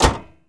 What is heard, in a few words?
Truck Dodge Ram Hood Close; Trucks